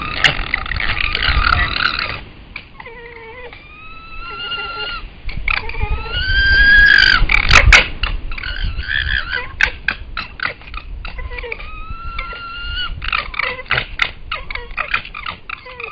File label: Fox Vocalisations while Trotting in Dispute; Red Fox; Communication